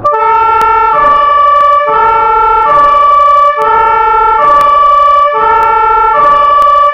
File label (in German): pressluft alt